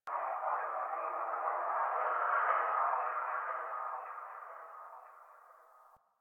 Landscape Distant Creatures Low Elevation, Ambience; One Offs; Warframe; Venus Open World